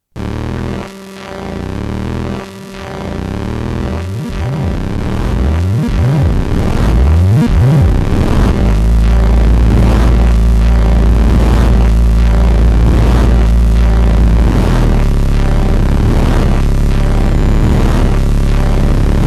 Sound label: 1bit groove; Gijs Gieskes